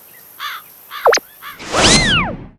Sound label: Start; OvenBreak 2; iOS mobile